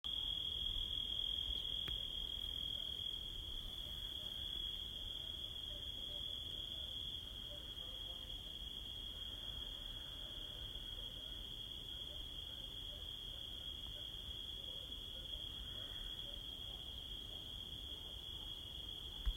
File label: Night crickets